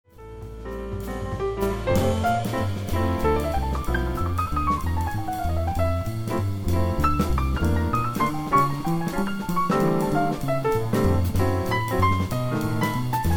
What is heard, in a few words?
solo piano